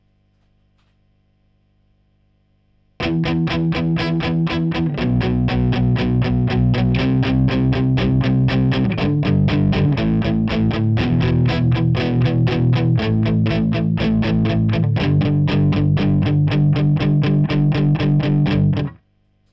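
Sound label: rode nt2-a guitar acht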